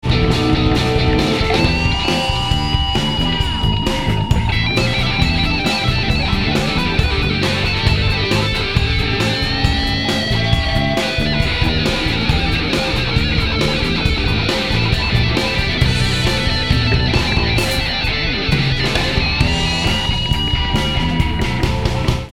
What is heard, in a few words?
Plays Electric Bass